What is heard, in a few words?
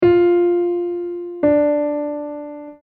Interval; pop vocals; grade 6